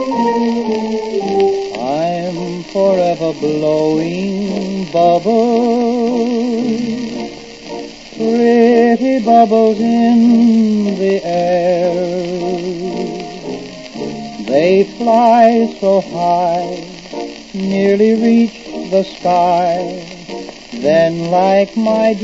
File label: Marimba; GreenBros; hymn